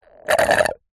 food drink straw suck last dregs drink slurp; zapsplat

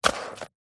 household mop single wipe movement on hard floor

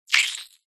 cartoon squelch squish mouth saliva

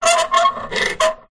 metal scrape on metal small; foley

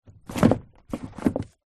household books bookcase movement slide; zapsplat

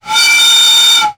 sound design effect air pipe pressure

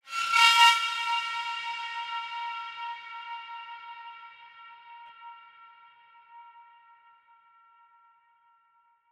sound design horror metal scrape

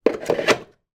toilet brush place in plastic holder

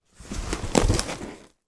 plastic packaging remove from cardboard box; foley

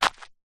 footstep single sandal woods ground stoney path

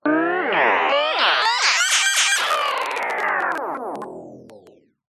glitch power down; sound design

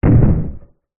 fantasy giant single footstep distant heavy wood; zapsplat

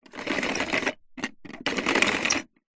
leisure toy plastic cogs turn single

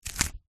cutting slicing chilli; food

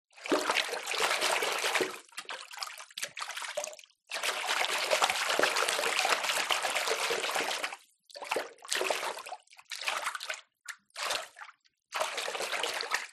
bathroom basin water shallow movements; zapsplat

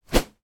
sport tennis racket fast movement swoosh; sound effects one

